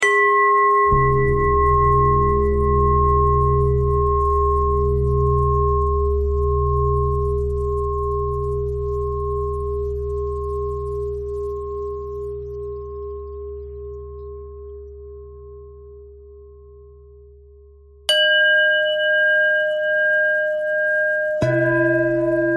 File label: bell thread pentatonic